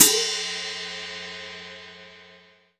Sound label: FL 14 HH, 14INCH FLANGE HI HAT